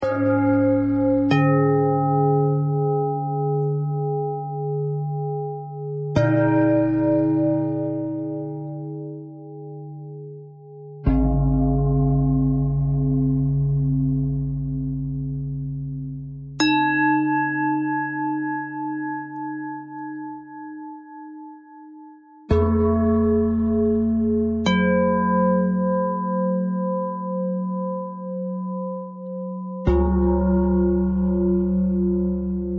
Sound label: bell thread pentatonic